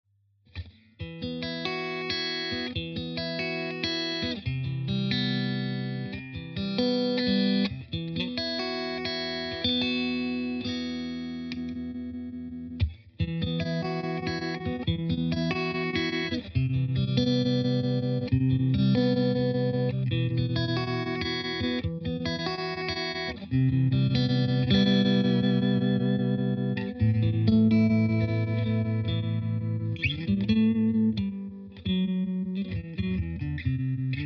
Crazy Tube Circuits Stoned Hz

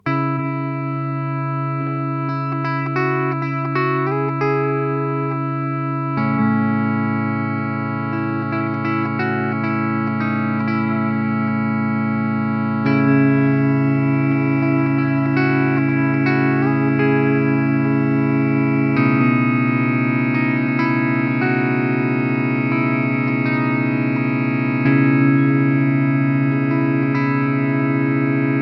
EHX Pico Deep Freeze Latch Mode

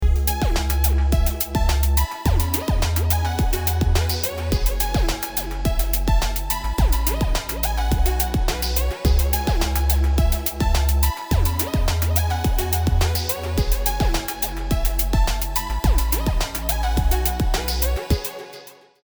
digitone

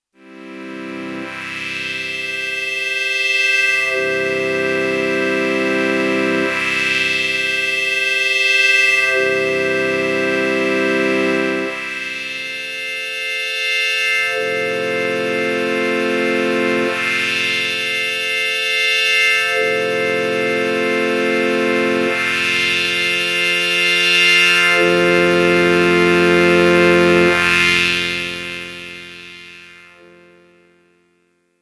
STREICHFETT

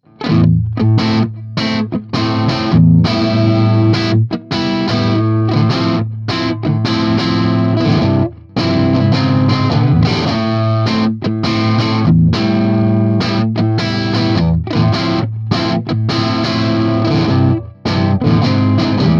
Mo Gain

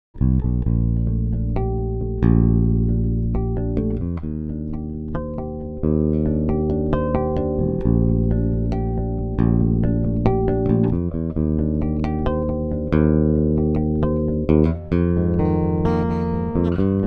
Harmonics Chords

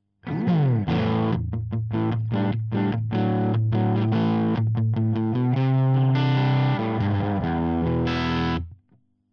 crunch; C414

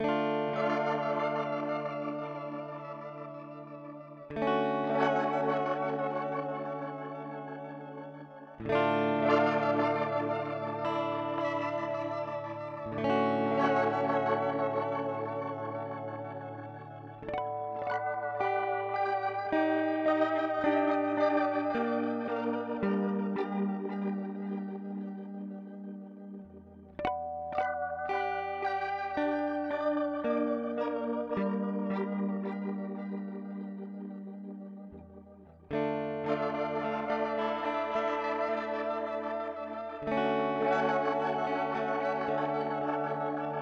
Mooer D7 X2 Crystal

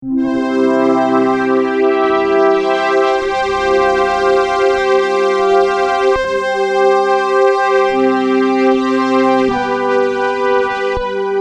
Preset String Synth

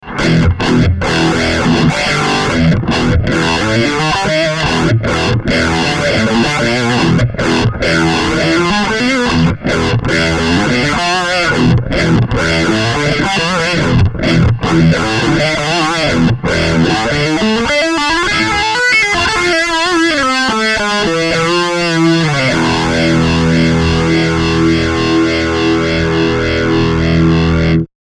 Mode Rotary Line Fuzz

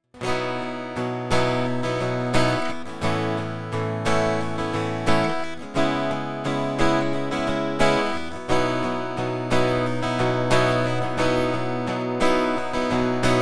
Takamine FT340 BS Pickup Flat

